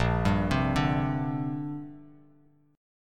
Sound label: Bb 6th Add 9th, Bass Standard; 6,10,10,7; Bb6add9 arpeggiated; JGuitar